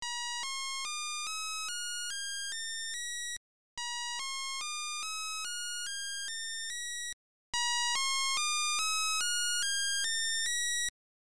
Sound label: Speaker Check; Mr. Driller; Namco System 12; arcade